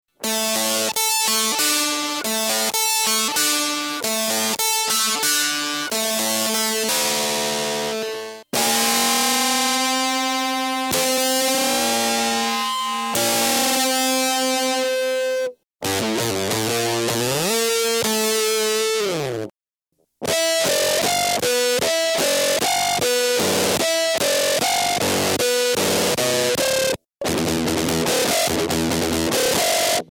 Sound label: treb